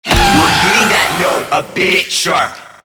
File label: Infested Lich Pain A; Warframe